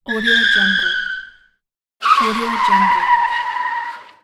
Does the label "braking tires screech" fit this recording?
Yes